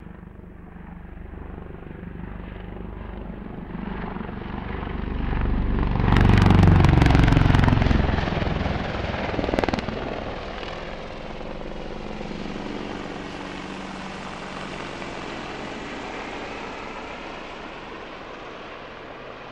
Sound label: Apache Banks Right, ah-64 apache